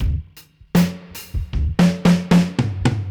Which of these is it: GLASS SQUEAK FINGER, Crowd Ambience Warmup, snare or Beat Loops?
Beat Loops